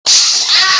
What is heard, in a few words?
Bald Head Slap